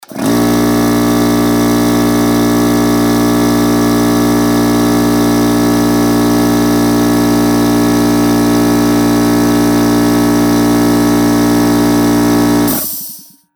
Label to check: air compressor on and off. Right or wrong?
Right